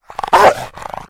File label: Rottweiler Growl